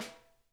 EDG TAP; Sonic Reality; Interactive Drum Kits

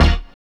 SYN, dance; Sound & Vision - Gigapack II

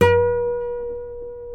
C 5 nylon, Guitars

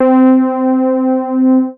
MOOG BASS C4; Best Service Dream Experience

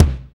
BD, BD REAL

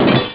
sword; Amiga Soundtracker sample

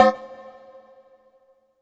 LEAD Darkness Pluck; Polyend; Plughugger

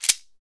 SHEKE, Afro Shakers, Shekeres

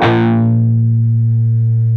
PRS PWR B2D, Guitars; PRS PWR CUTS

